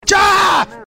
jjjjaahhh

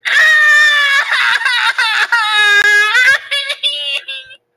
crying

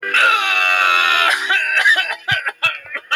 crying; rat in the hat

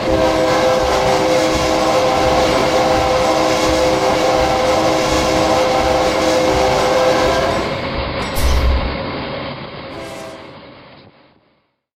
bnsf dash 9 k3la train horn ex atsf

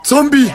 zombie; frankfurt

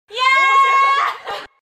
yaaaaaaa shouting notification ringtone

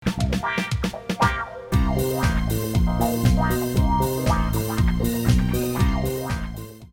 stringed disco

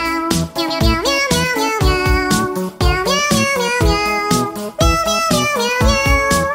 meow jingle bells

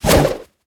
thrown dagger; grave robber

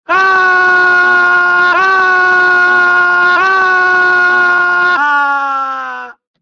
yell

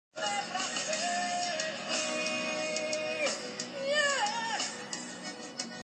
inhee

